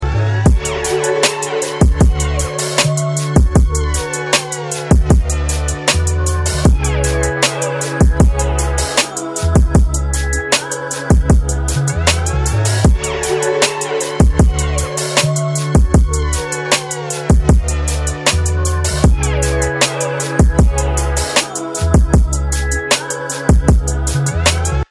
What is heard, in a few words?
instrumental ringtone